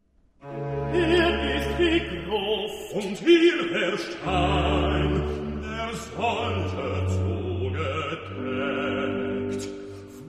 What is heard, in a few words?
Easter Oratorio Recitativo; Hier ist die Gruft; BWV249; Johann Sebastian Bach (PD)